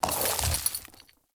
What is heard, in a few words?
Horse Brakes Trot; Vehicles; Foley Actions; Warframe; Duviri